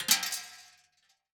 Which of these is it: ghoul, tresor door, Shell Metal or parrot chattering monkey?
Shell Metal